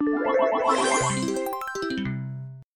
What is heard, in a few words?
game complete effect